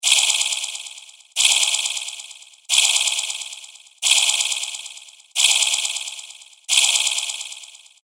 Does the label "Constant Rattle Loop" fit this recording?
Yes